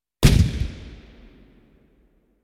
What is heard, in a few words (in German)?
Geschuetz; Militaer